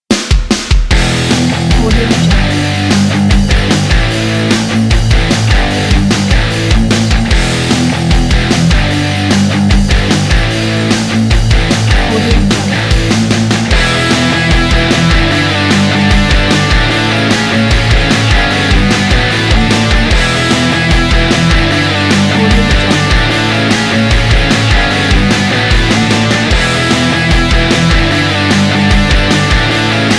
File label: driving rock